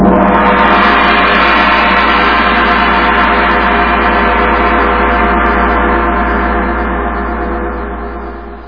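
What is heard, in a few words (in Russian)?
гонг одиночный глубокий длинный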